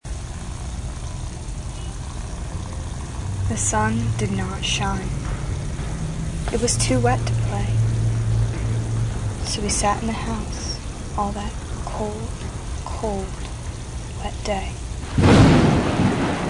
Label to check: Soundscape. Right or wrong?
Right